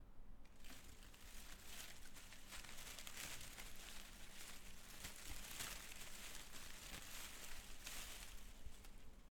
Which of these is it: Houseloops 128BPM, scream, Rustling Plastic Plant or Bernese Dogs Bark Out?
Rustling Plastic Plant